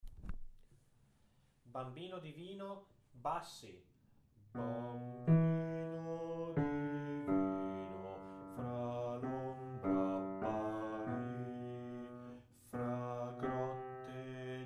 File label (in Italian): bassi; bambino divino